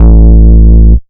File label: Bass Shot A